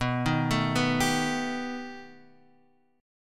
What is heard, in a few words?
B 7th Flat 13th B, guitar chord